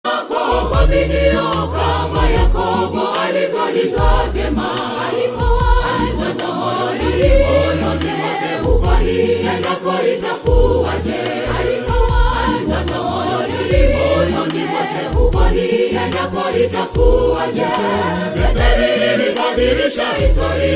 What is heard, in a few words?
Choir; Kurasini SDA Choir; Betheli